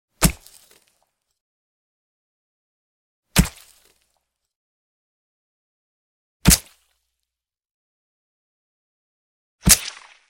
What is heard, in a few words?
Knife Stab 8X; Hollywood Edge; Martial Arts and Human Impacts